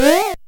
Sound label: sfx; blinkys scary school; Sound Images; Tony Williams